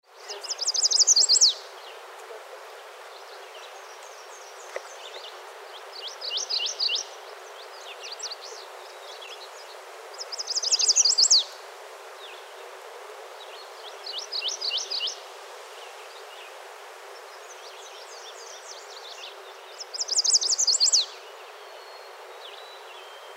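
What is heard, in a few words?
Chestnut-sided Warbler Mourning Warbler; 35 Sec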